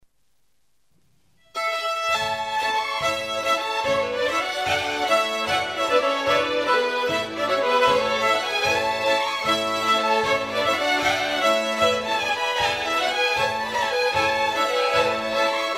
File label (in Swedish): Schottis från Rörostrakten